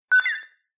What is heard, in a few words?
science fiction computer or robot beep short single